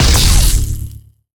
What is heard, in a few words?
Chain Lightning Fire Burst; Evolve; Elder Kraken